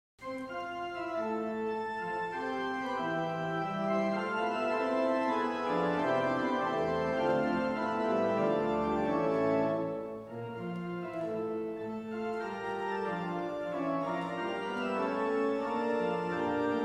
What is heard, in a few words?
Fugue, organ; Rumi